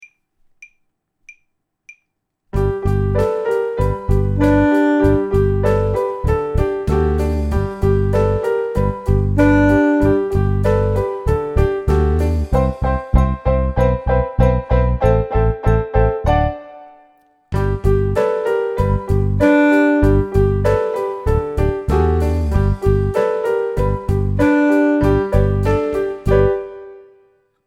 saxophone; mib

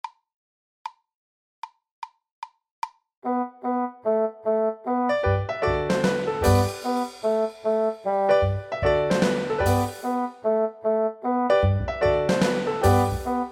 basson

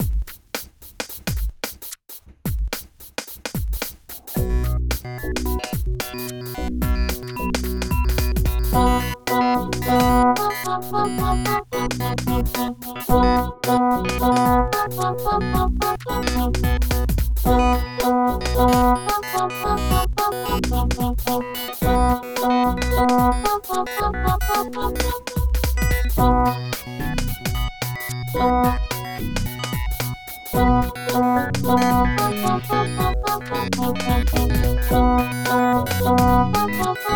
basson

